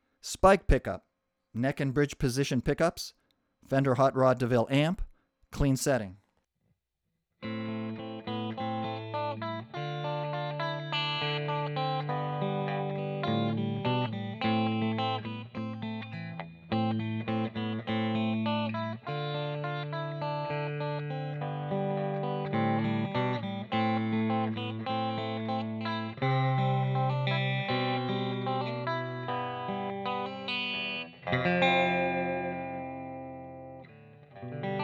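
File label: neck bridge hot clean, guitar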